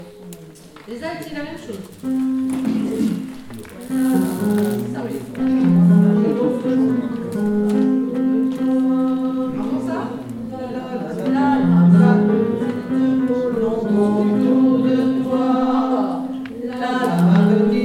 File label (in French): alti fin, chorale